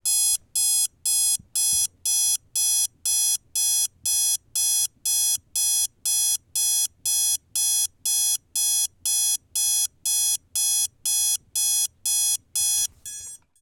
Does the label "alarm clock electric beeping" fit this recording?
Yes